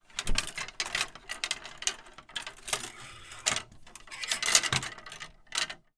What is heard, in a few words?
household coat hangers wooden hotel closet movement